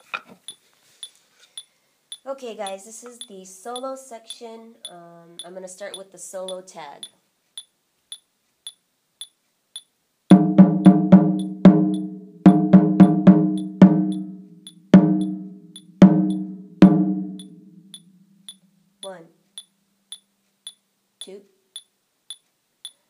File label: Solo Breakdown, taiko; Omiyage